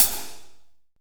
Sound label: Kit Heavy HHCL; CMI